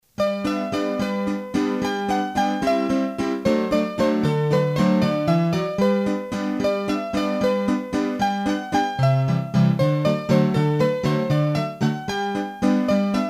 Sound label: Polka, hurdy-gurdy; Polka du 'Tsa'